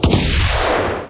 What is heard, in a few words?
Vortex Explosion; Subsuming